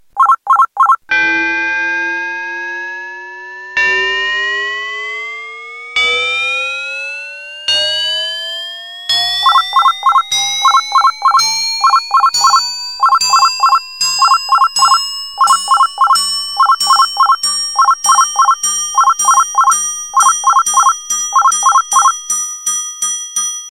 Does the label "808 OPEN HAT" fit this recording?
No